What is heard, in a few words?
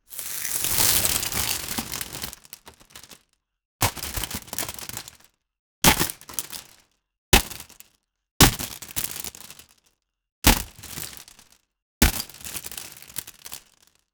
sound of crispy fried pot, crinkling